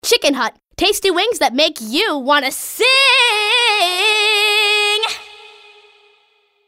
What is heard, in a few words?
Sing